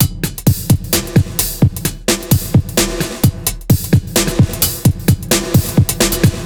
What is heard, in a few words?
Seven Looping